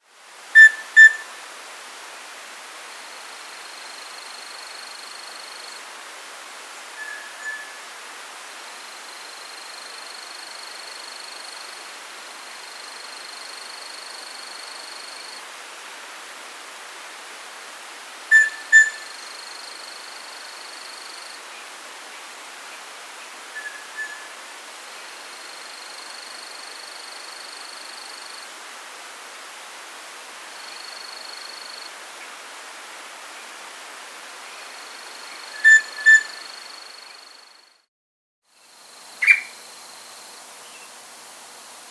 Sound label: Rufous-Breasted Antthrush (Formicarius Rufipectus), Andean Birds; S. America